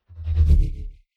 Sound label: sci-fi vehicle drive by metal wobble fast; raumschiff